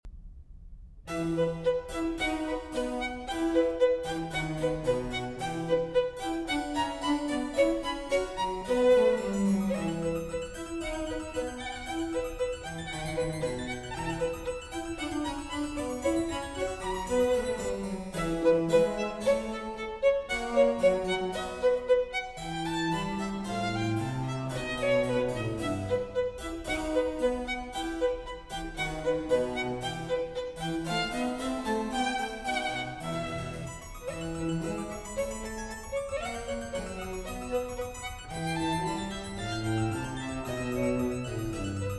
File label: Sonata in E minor for Violin and Continuo